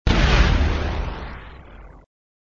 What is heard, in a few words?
Sound SFX, flipper; sttng